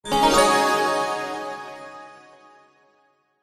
multimedia game sound happy bright warm positive tone